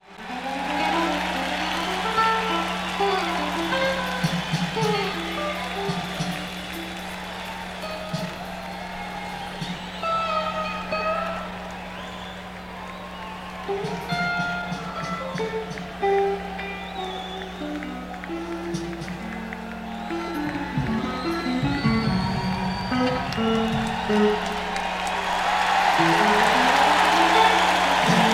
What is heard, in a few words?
Crowd Tuning